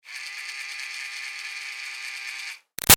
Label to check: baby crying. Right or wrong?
Wrong